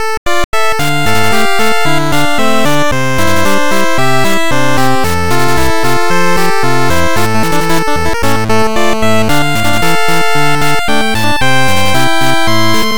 8bit Loop; yuusya iku

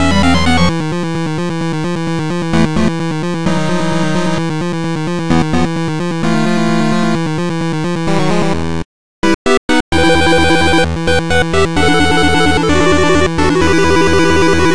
8bit Loop; maou kessen

